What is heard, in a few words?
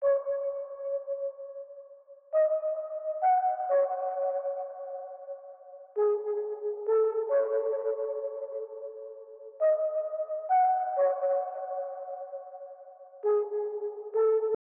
132 BPM C# Min Lead; Trap; Loop Stems; Glock